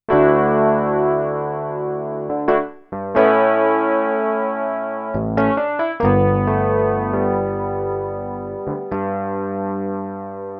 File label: EPiano2